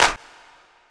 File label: CLP, Disco Fever drum samples; Best Service ProSamples vol.48 - Disco Fever; AKAI